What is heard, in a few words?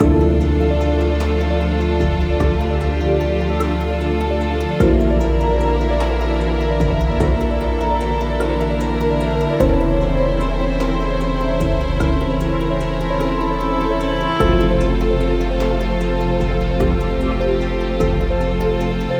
Hollow Hope loop; Survival Metroidvania Vol2